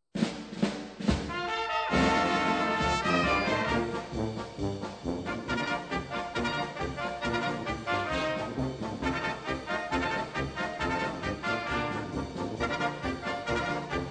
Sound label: TRUMPET ECHO POLKA